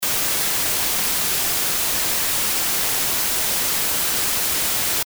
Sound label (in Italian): Rumore bianco